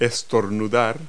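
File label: sneeze; Multi-Lingual Talking Picture Dictionary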